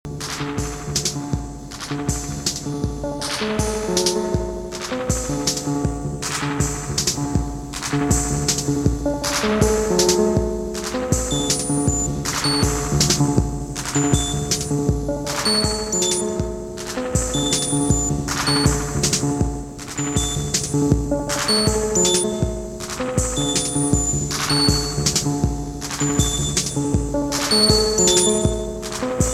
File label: instrumental